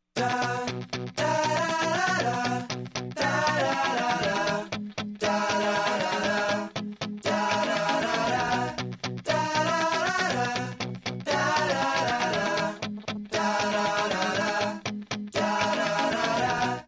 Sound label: Ringtone